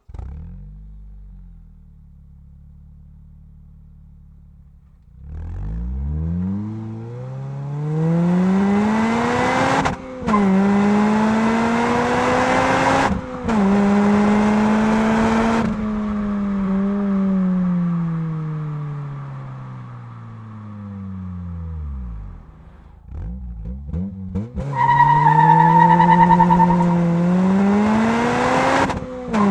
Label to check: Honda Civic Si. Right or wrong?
Right